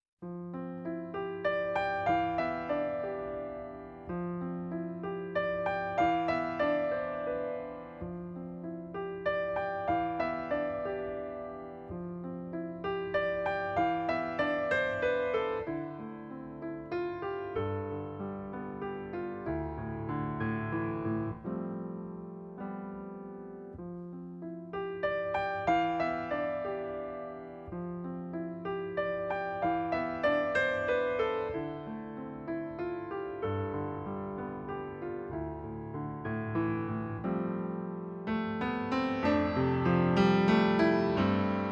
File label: Piano Solo